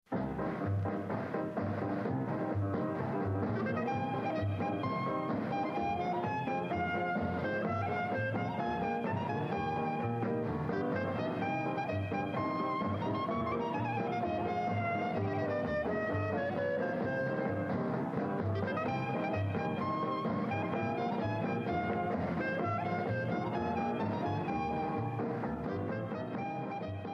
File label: bulgar freilach tantz